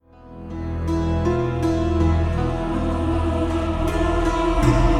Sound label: Ambient Start; The Temple; prince of persia 2008 gamerip